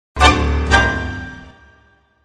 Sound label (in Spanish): Cierre TANGO